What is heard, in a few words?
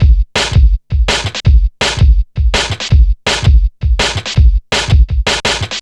bash street, Drum Bass Drumloops 165bpm; Zero-G; Total Drum Bass